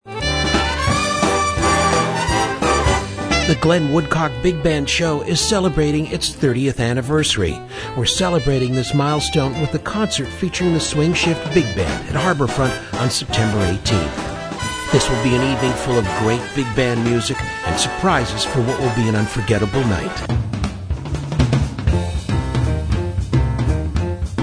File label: bigband